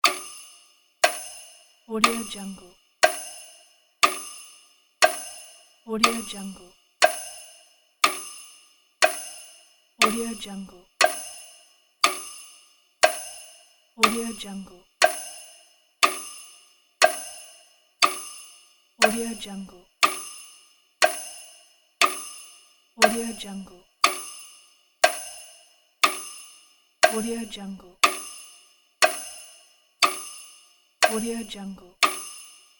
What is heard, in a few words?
christmas countdown clock